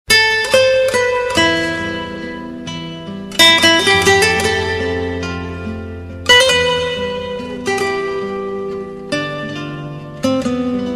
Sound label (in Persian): Ringtone Bikalam; Sad